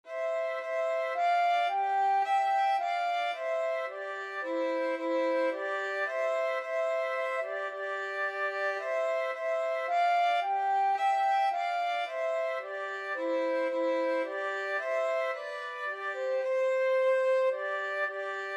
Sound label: violin flute duet; beethoven ode to joy